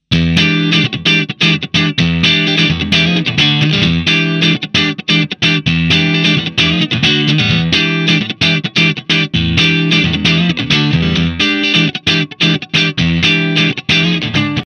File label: clean